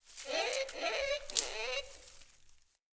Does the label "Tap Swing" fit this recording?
No